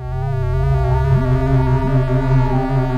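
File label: H2O PARTICLE; Pro-Rec - Synthsonic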